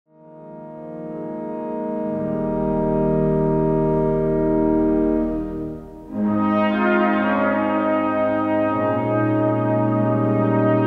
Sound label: oboe